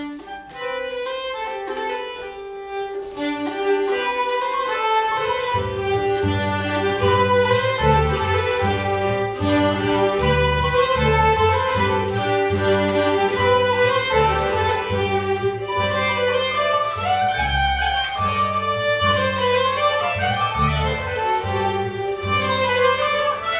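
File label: Anglaise, nyckelharpa; Anglaise efter Ola Hansson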